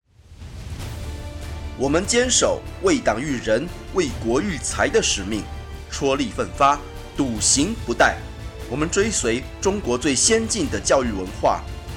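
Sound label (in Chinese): 教育宣传片（大气）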